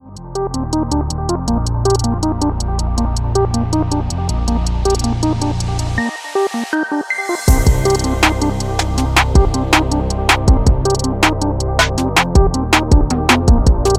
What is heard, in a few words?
Cool Hip Hop Instrumental Beat Ringtone